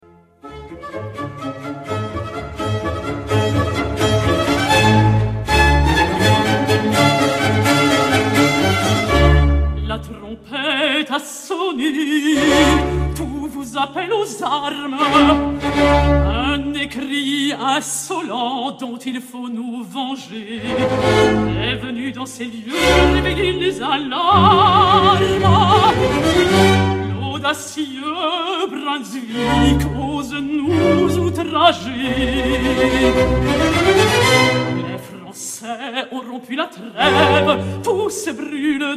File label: Recitativo La Trompette